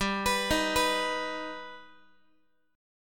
G Augmented G Guitar; arpeggiated

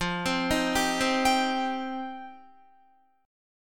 F 7th Suspended 2nd Guitar, chord; F7sus2; 12,15,15,12,12,15; 4F,4A,5C,5G,5C,6E; arpeggiated

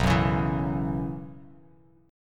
B Suspended 2nd Suspended 4th, Bass Standard; strummed; JGuitar

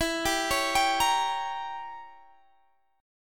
E Diminished 7th E down Guitar Half Step Down

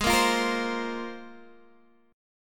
C 7th Suspended 4th Sharp 5th

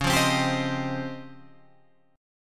Db Major Sharp 11th, Guitar Open G

